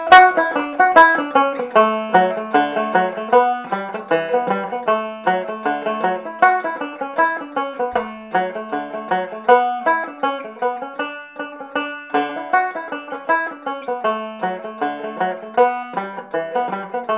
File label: st annes reel banjo; tune tutor